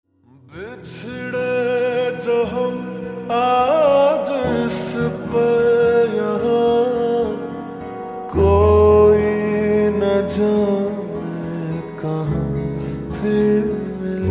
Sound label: Slowed Reverb Ringtone